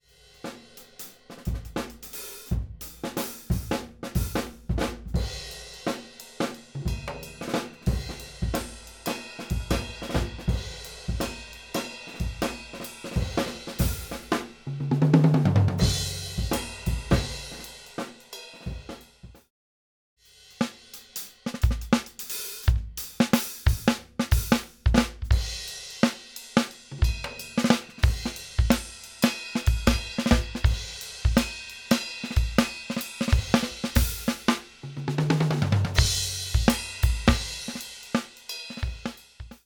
drums raw processed, Entire drum set before/after EQ; Mixing for God audio examples